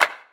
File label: good clap sound c sharp key, clap sample tuned to the C# note. Loudest frequency: 1918Hz; single hits one shots